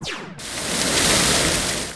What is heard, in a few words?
water spraying; spirit handler; ki sul